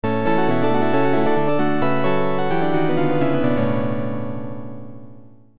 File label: Electric Piano Bright; Zephyrus; Syntheway